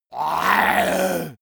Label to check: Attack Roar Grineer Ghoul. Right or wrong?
Wrong